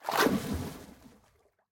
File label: enter, ambient underwater; Minecraft; Votive World